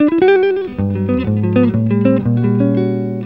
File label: EGUIT ARPEGG; Best Service; World Colours